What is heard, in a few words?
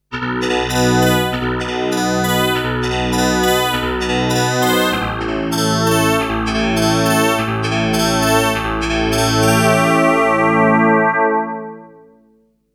dx7ii harpsi